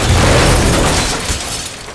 heavy hover death; 1997 MMX LAN PACK (win 95); Army Men

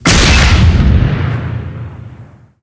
farty; 1997 MMX LAN PACK (win 95)

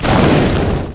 Picnic Basket Destroy; 1997 MMX LAN PACK (win 95); Army Men

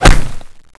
Sandwich Shoot; 1997 MMX LAN PACK (win 95); Army Men

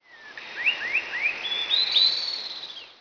grass; 1997 MMX LAN PACK (win 95); Age of empires

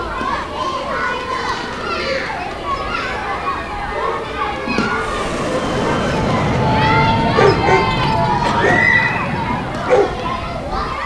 suburban Ambient; 1997 MMX LAN PACK (win 95); Army Men

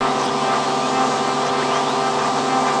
radar; 1997 MMX LAN PACK (win 95)

